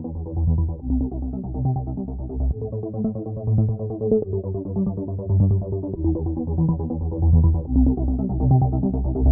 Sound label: Electro Starup Ringtone